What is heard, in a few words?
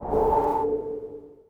portal created; blek; android; wii u